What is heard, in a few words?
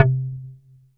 WOOD, DRUMS; Music Store Sound Service; Einstein